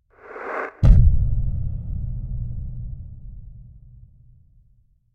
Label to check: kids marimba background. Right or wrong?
Wrong